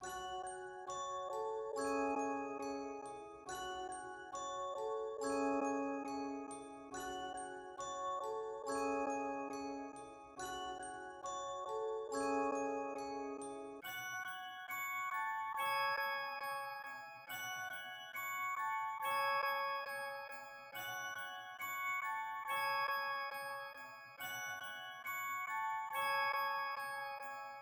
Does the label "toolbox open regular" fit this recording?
No